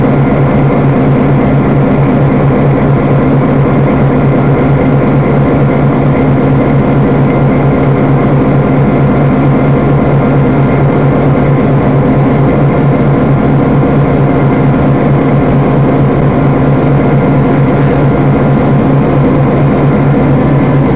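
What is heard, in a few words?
FP7 idle and steam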